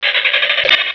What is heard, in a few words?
STARTCAR